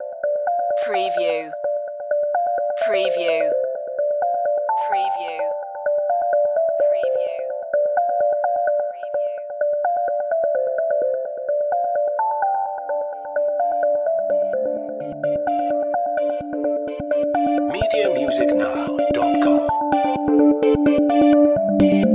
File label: playful ions loop